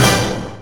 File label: HIT, Hits & Brass